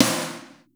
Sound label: ROCK S; Crypton - DrumBase5000; R70 KIT #2